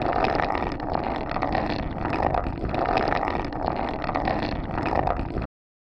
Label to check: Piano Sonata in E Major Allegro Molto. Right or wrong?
Wrong